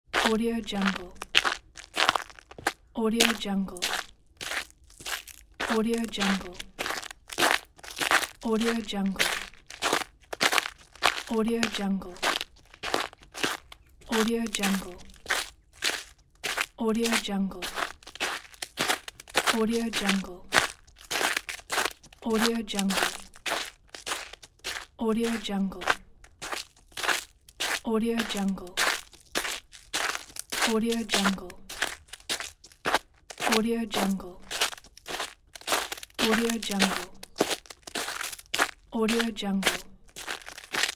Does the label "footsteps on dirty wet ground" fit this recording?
Yes